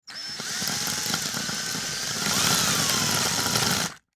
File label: Drill Electric Into Wood; Drills; Tools; Industry; Blastwave